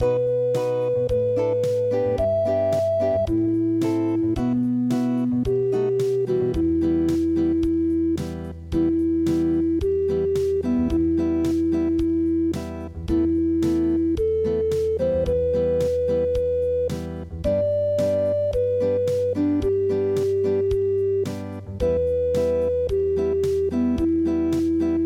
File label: unpluged